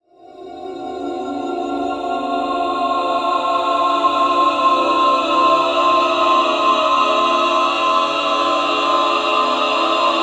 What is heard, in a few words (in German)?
oiseaux gestreckt